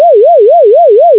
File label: chirp; chirps